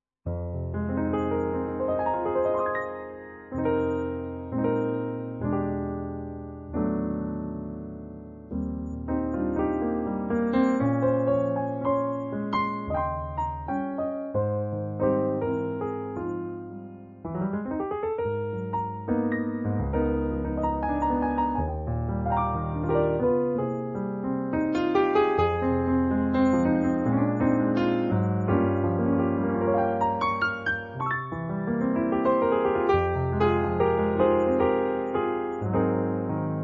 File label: piano solo